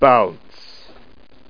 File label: bounce